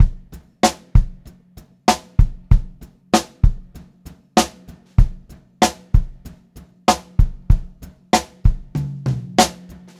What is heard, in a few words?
Pop-Rock Drumloops; 96 POP 2